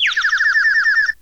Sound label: head bonk birdies; Crash Bang Boom